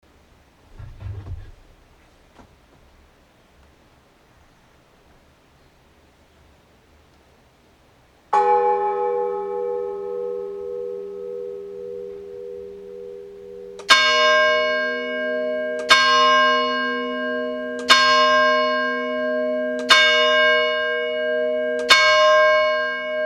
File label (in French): cloche; Moustier Ste Marie